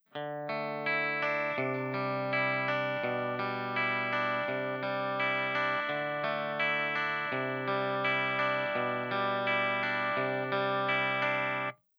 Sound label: modulation without